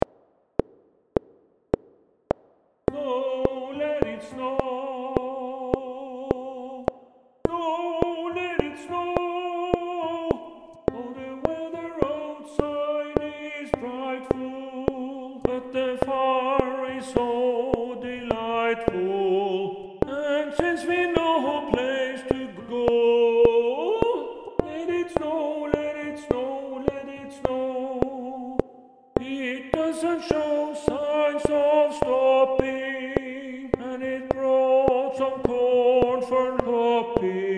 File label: TENOR CLICK